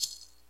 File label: Shaker Sounds